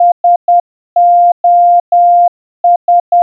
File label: morse sos